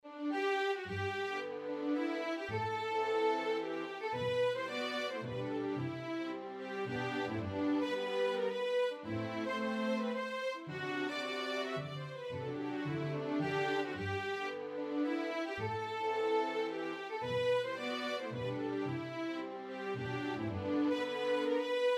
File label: Waltz Ensemble; Jeanettes